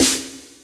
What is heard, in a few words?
vegas, snares real